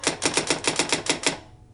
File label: typewriter